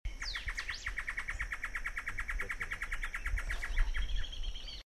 Taraba major machine gun

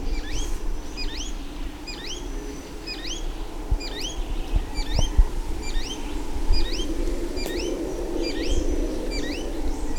Pachyramphus viridis playback

